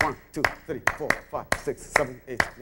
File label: subdivision of 8 beats loop